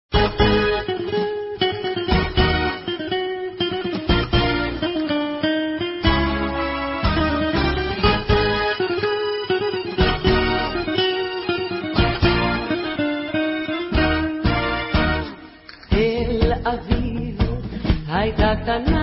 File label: Tango